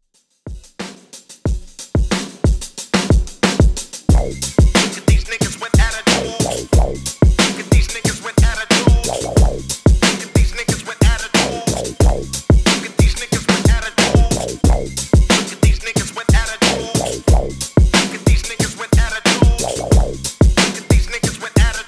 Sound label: Old School Redrum 91Bpm Dirty